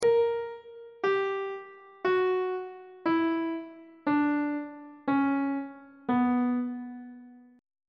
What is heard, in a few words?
c flat harmonic minor scale descending; on treble clef